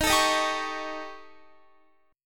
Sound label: D sharp 7th Flat 5th, Guitar; D#7b5; strummed; x,18,19,20,19,20; 4E,4A,5D,5G,5C,6F; chord shape